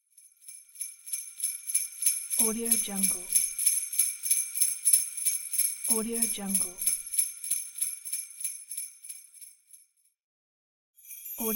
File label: sleigh bells